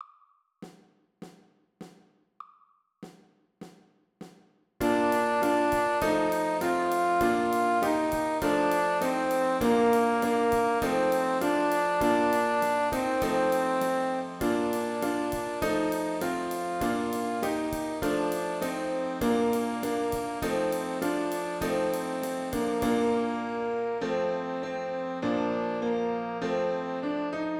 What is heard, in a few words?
Joyful Joyful Sax